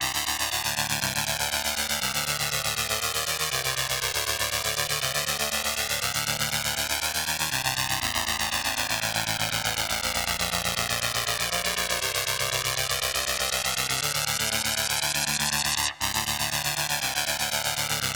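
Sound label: Phase Thin, drone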